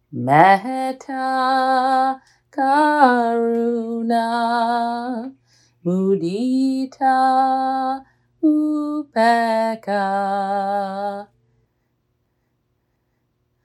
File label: chant